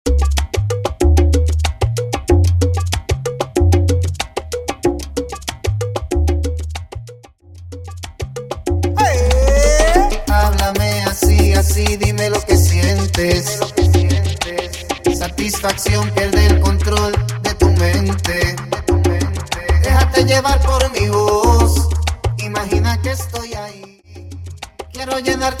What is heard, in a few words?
Percapella Intro Outro 94 BPM